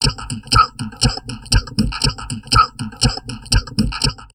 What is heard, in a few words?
TALK 120, Drum Loops Crazy Processed; USB Soundscan vol.07; AKAI